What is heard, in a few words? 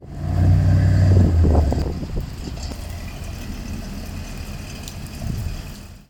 Corvette C3; hunsrueck